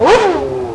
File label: Grid rev, Bike; CASTROL